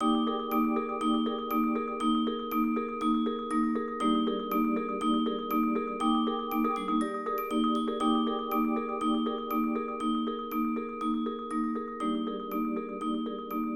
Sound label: Percussion Ensemble 4 Players, Instrumentation: Bells, Xylophone, Marimba, Vibes